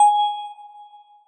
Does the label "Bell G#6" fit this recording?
Yes